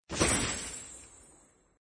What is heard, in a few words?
coins collect